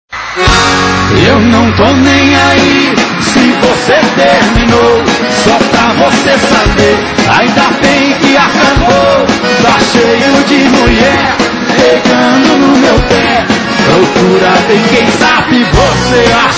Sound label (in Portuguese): musica boa